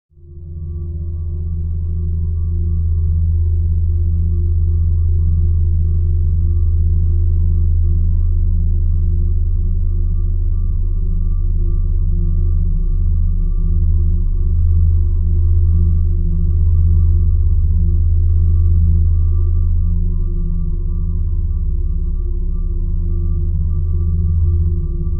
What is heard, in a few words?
Bed Penetrating Pres